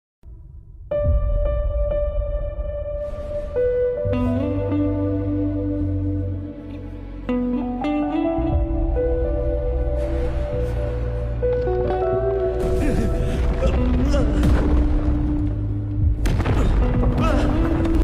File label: climax emotional bgm; maaveeran; sivakarthikeyan; aditi shankar